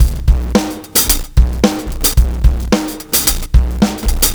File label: R&B Drums